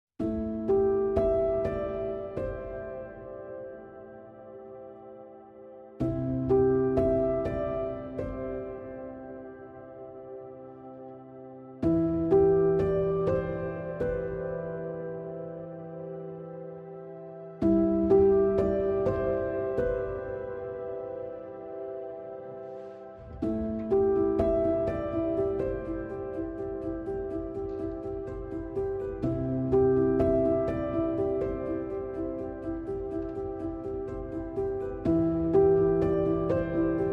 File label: No Strings